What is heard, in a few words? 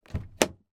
van door open interior mercedes sprinter; transportation; sound effects; sound effects one